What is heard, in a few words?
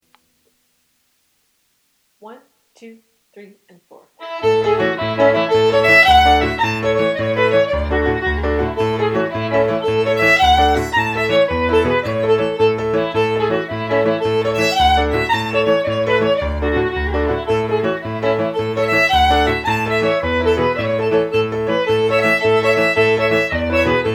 Fiddle and Piano At Tempo